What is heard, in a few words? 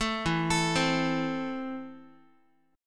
E Suspended 4th Sharp 5th, Guitar, Esus4#5 chord; arpeggiated; JGuitar